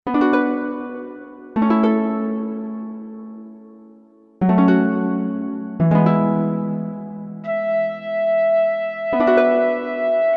Harp Flute, Korg MSC-1S; Korg MSC-1S Variety

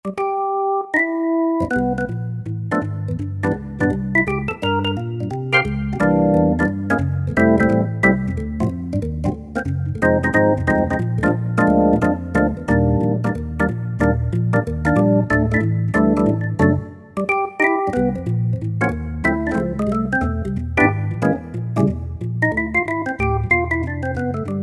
PERC. ORGAN, Roland SC-880; Factory Patches

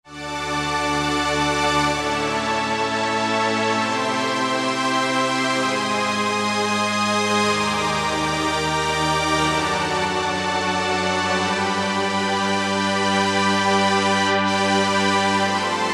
Solina, vintage synth; Roland SR-JV80-04

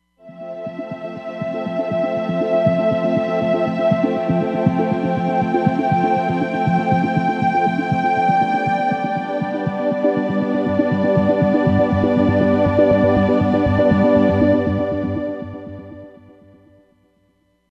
Pad

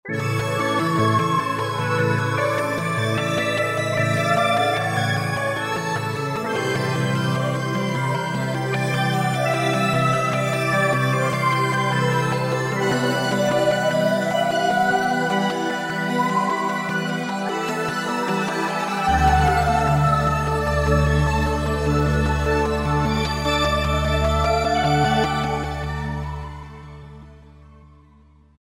Stepped Pad; Roland JV-2080

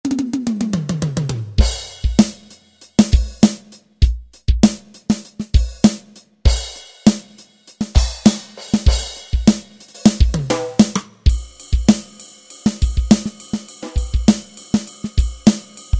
Dr Velocity Kit, Yamaha EX5R; Factory presets

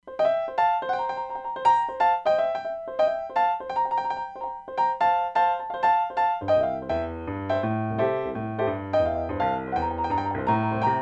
Harmonized Honky Tonk; Roland DEP-5; Factory Patches

